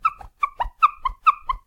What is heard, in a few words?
polish, きゅっきゅ磨く; きゅっきゅ磨く２